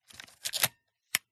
Camera Film 35mm SLR CANON AE-1 Program Film Advance Wind Open Back; Advancing Wind; MKH8040; PMSFX